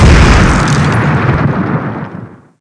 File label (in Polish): giant lapa uderza w glebe; proj impact; the monster plane; kolmods